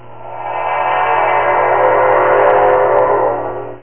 Teleport; solotrekii

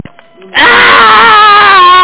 argh

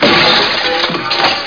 crash!!; yagg

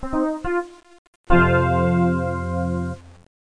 level end

